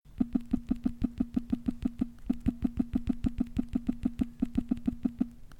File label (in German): huawei p40 vibration tippen; Vibrationsmotoren im Smartphone